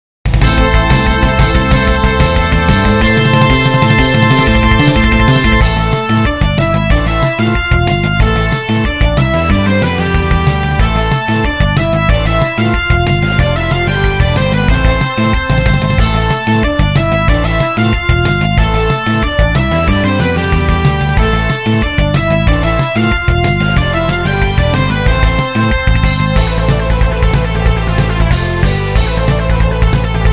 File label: battle; maoudamashii; ninja